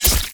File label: sword